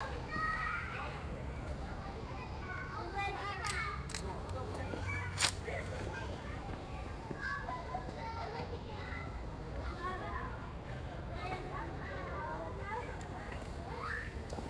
quiet park ambience with distant kids and handling; crowds